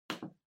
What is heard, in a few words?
Washer Front Load Button